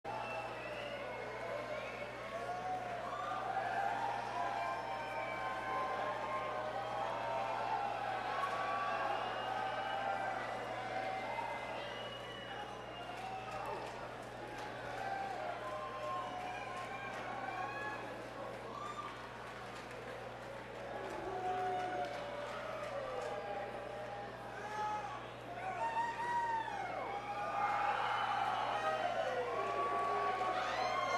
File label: Crowd n Banter